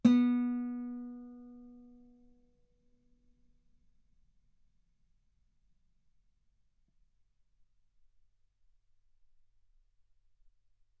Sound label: Guitar B3; ff; sul B